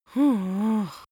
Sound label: strongly disappointed boy; comedy-cartoon; soundpool